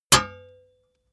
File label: metal impact sword plate